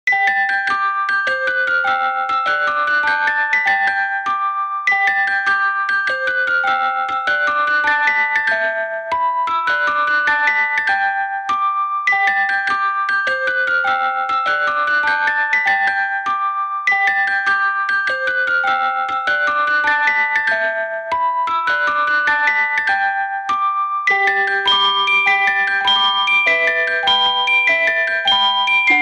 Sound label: Ice Cream Truck, Away at Sea